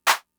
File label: Clap; Fricke MFB-522